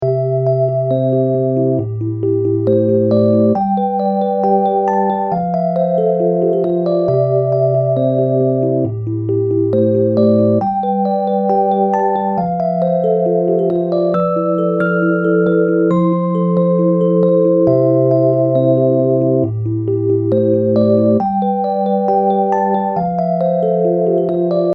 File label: HiRes E piano